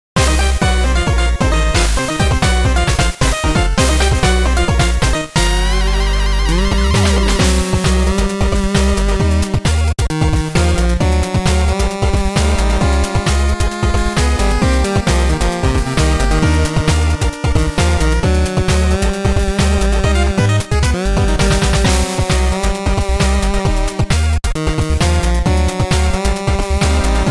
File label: 8Bit VRC6; JoJos Bizarre Adventure OP 2